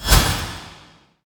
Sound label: tower wicked sisters attack